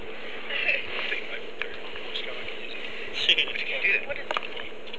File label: rrr